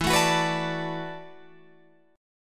E 7th Suspended 2nd Suspended 4th, down, Guitar, Open F; E7sus2sus4; strummed